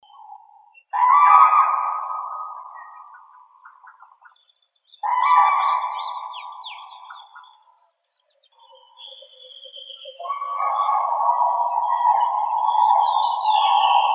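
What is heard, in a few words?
common crane, Grus grus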